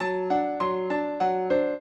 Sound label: piano; Mozart